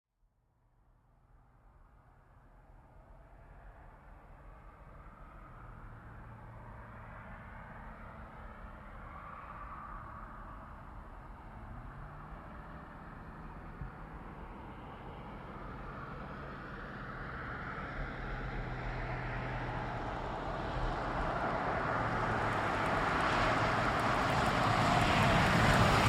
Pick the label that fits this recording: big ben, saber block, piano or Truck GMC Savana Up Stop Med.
Truck GMC Savana Up Stop Med